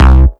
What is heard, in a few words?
EDM; Warframe; Warframes; Bard; Bard Instrument Packs